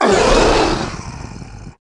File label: monster zombie warlord death; the monster plane; kolmods